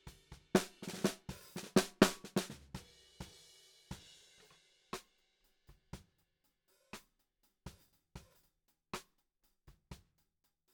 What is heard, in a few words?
Snare Bot